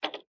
REEL STOP; slots; Monster Chef

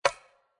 reelstop; slots; Sushi Suprise

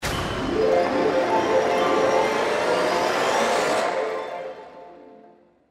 Free Spin Anticipation; slots; Valentine Blast

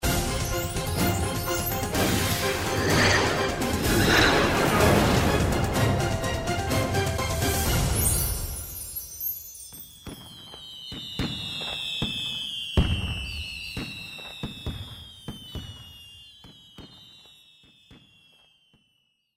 jackpot Animation; Crazy Rockets

